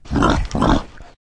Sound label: boar idle; STALKER; customnpcs